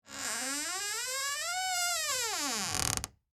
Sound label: Door Squeak Long, Foley, Door, Squeak, Long, Creak; Miscellaneous Doors